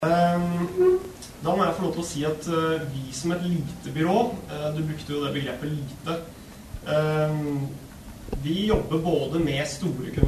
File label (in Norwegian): ringetone